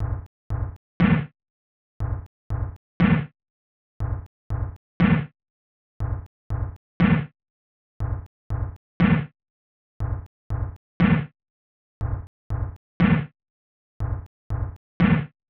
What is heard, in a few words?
Sine Wave